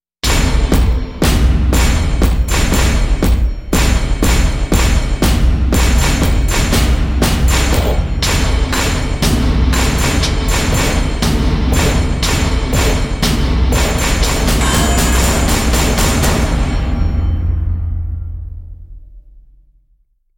march of rage, soundscapes

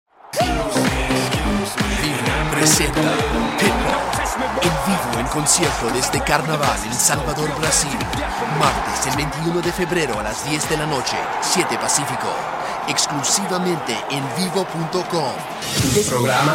Spanish Reel

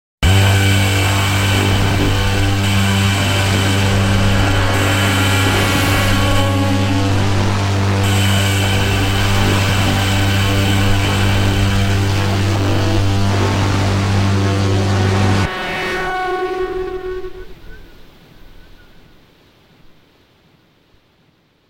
red engine, soundscapes

